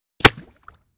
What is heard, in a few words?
Bullet Impacts Water